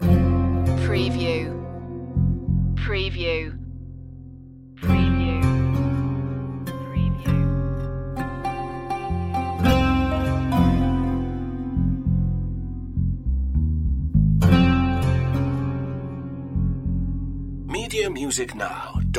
lost city loop

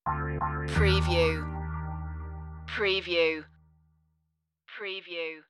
boogie disco stinger

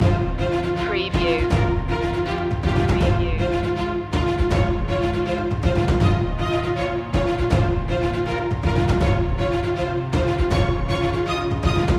land loop

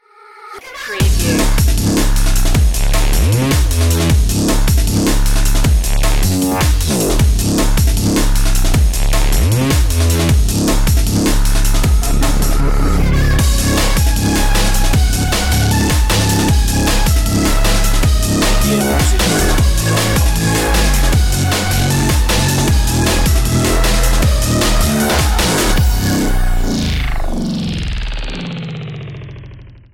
bass tricks

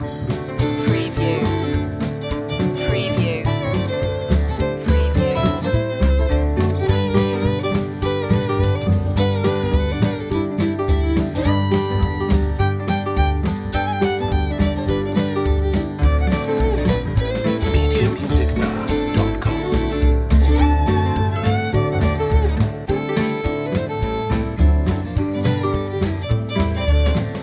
slapped bass while grandma played the fiddle loop

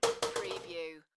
Dropping a Drinks Can On Its Side; beanstalk audio

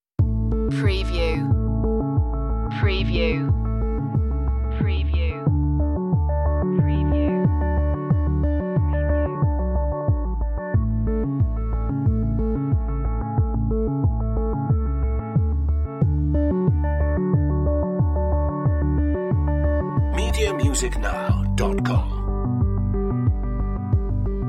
corporate sooth

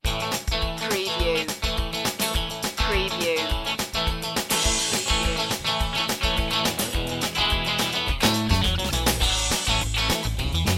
stomp underscore

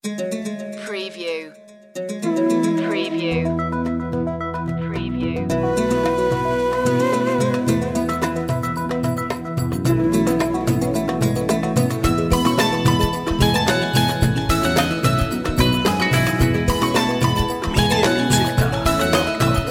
soft summer breeze

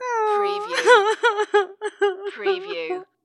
Female Sob

